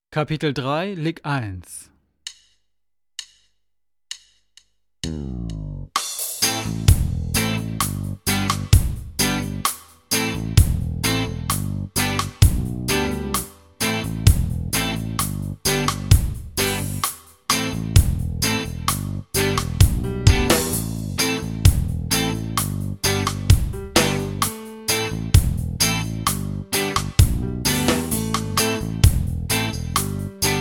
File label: Latin Style Guitar